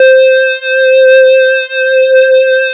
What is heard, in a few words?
Theremin; Cymatics; Noise Osc Samples for Serum